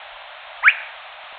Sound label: whit, Empidonax traillii; Passeriformes; Tyrannidae